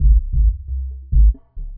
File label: beat band l; DirectX SDK; DMusProd